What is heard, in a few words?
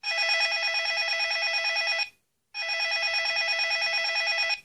bell ringtone; samsung sgh t100